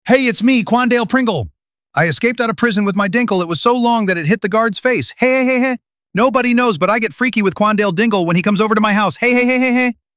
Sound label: hehehh